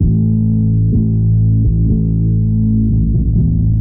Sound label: bass mounting dread, Loops, Sad